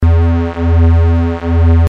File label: bass; east europe